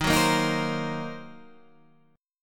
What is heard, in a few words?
Gb 6th Flat 5th Eb